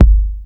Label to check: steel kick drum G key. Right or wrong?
Right